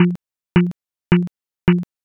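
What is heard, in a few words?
footsteps flooring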